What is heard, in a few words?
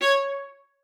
C#5, VIOLA SPICC; Xsample VOL-5